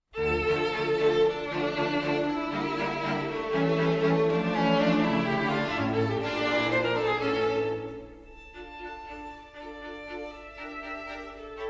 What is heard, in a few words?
string quartet